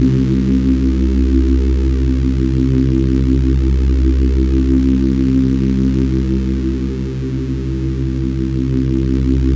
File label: C2, Synth Leads